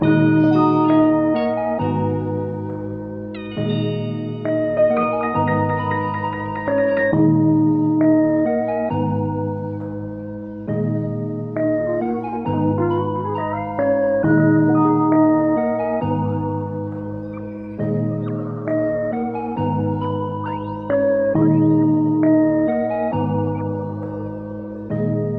135 BPM D# Min, Melodies; Cymatics; 2022 Melody Mystery Expansion

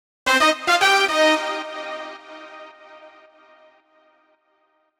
Brass G# 110Bpm, House; Ghosthack Advent Calendar; House Kit; House Construction Kit

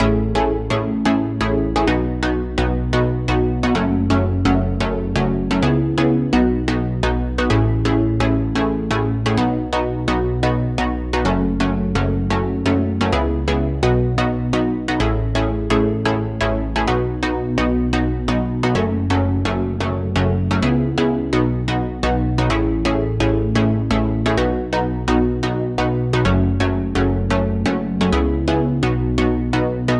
House Chord Loop, 128 BPM C# Min, Synths; Loops

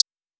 closed hihat, drum one shots

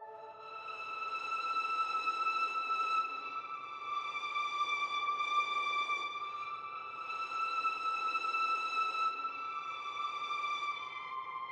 79 BPM C Maj Strings; Cymatics; Gems Free Teaser Pack; Cymatics Gems Vol 2; Memories

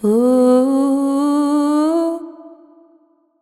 Ohoho, Female Vocals, Wet; Advent Calendar 2017 Day 1

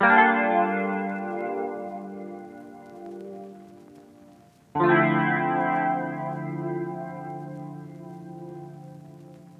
R&B Melody Loop - 100 BPM D Min Guitar; Melodies; Loop Stems; Cymatics; Oracle Sample Pack

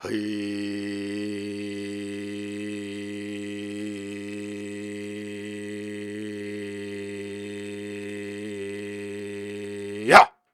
Sustains, Viking Vocal

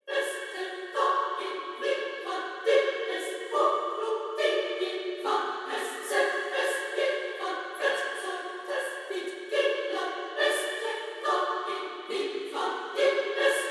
140bpm Vocal Choir F#m; FL Cloud

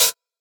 Open Hat; Ghosthack Advent Calendar 2017 Day 5

